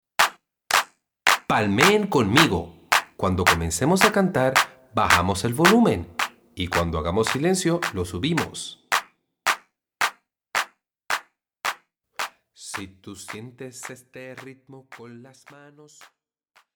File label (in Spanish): ESTE RITMO